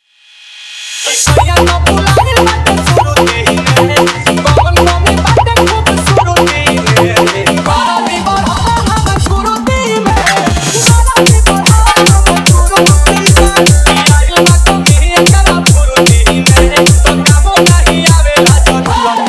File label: Bhojpuri Remix Song